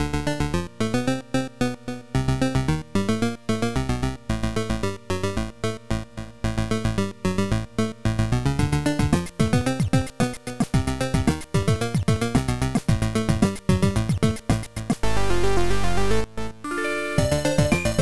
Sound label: Techo; Planet G; bomberman 93; turbografx 16; pc engine